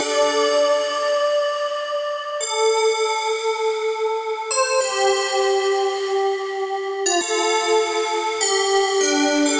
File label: D Phrase Choral Bells; Zero-G; Future Wave